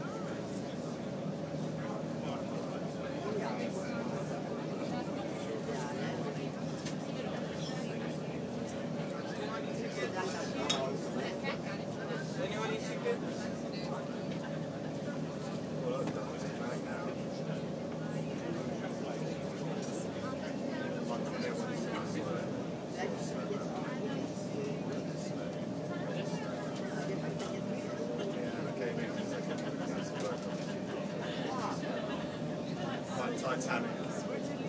thames clipper coasting for 2m then engine pitch changes as we speed up; at gun wharves